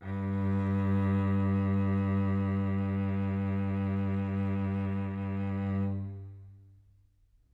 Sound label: cello; interpolator